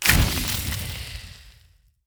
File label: fire buff